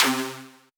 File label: Synth, DnB One Shots